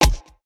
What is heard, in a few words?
Rebel Attack Impact; Android; Portal Quest